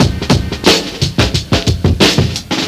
BRK BEAT; DRUMLOOP; eXtended Instrument

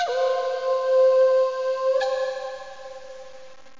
roland d50 moogly, synths; Zoom - Release 2 (1996); Active Software

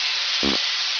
Skunk Gas Loop; PC Gamer IT CD 41; WaDemo

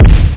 Cannon; PowerMonger

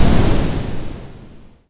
water off; Panic Volcano

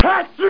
sneeze

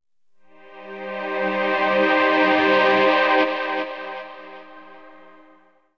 synth pad ambient 160 C; Sphere

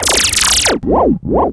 system repair end; Earth and Beyond; EA Games

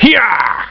Punch Kick; Realmz 4.2.2

